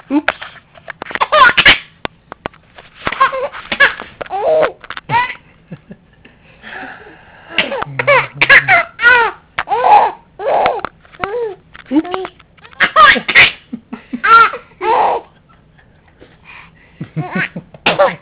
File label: home baby laughter